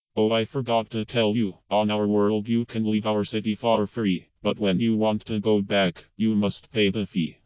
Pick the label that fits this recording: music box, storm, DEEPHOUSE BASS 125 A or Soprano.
storm